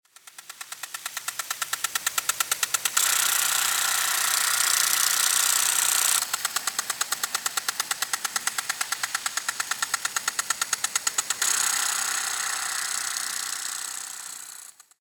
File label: Sprinkler Noise; ambient